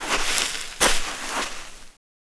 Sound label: grass